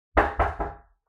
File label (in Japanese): 扉をノックされる音